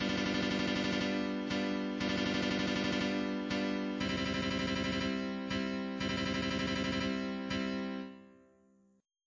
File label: rasgueado; orpheus